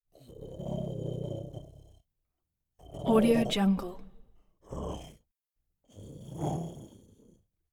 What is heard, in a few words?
humanoid creature voice growls purrs whimper